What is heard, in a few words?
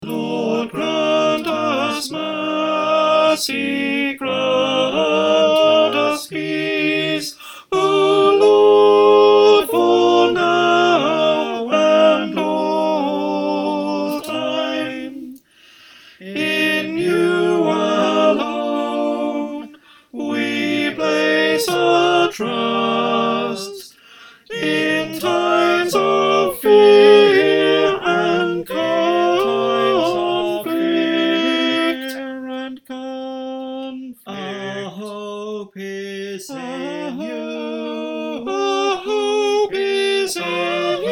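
alto, choir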